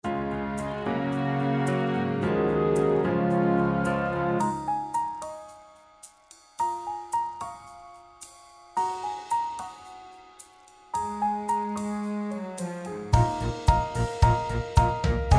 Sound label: Song Style Inspirational